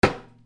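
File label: percussion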